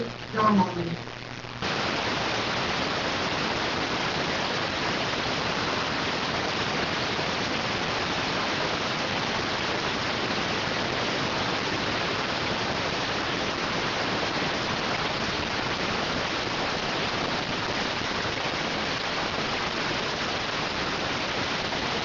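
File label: radioteletype receive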